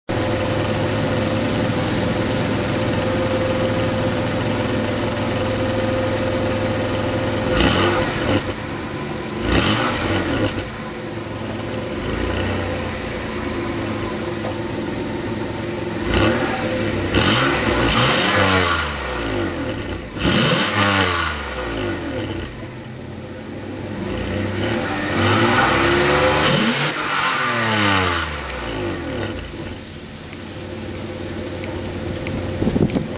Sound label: rev, ypipe